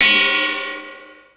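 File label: 9000 BELL ride, LINN 9000; Hollywood Edge; The Big Bad Beatmachine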